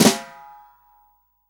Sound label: SWING SNARE CHROMATIC, Real Drum Kit; REAL DRUMS